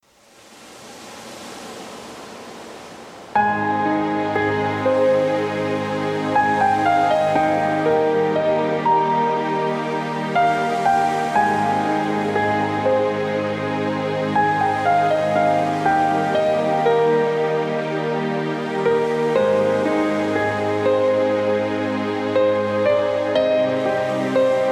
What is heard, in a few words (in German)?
Entspannungsmusik mit Klavier